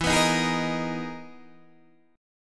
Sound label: E Major Sharp 11th E, Guitar, Open D; chord shape